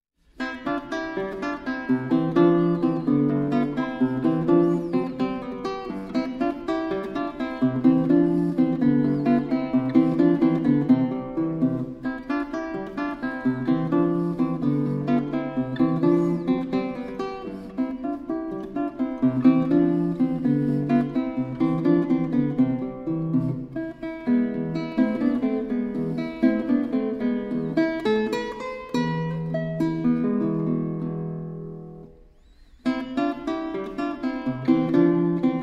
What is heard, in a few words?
guitar; Sonatina No.5 In C Ms85; Allegretto; Paganini